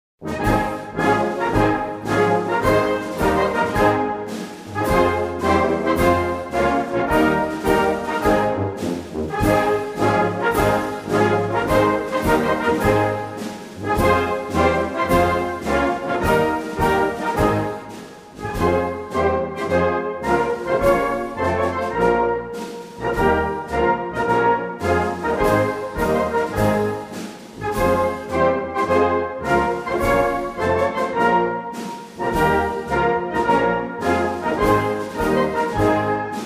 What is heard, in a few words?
anthem tunisia